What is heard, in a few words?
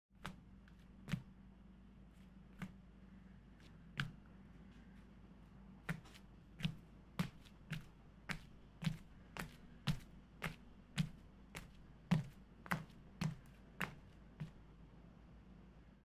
slippers; راه رفتن با دمپایی